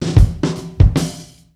intro 76bpm, Hip-Hop Street Beats; Construction Kit; Street Beats by Poogie Bell

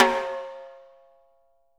6X12 WOOD; Living Drums

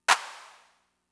Clap; Fricke MFB-522

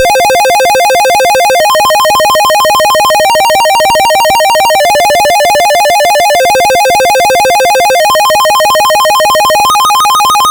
NES Arp, chiptune synth; Sonic Foundry; Nintendo Entertainment System

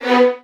VN FX, Virtuoso Strings

